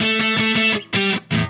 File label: Happy Pub Guitar